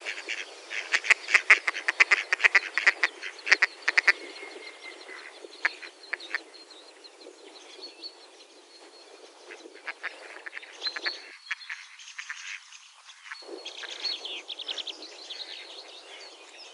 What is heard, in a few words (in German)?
Stockente; Ornithologie